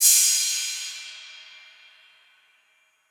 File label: Crash; Cymbals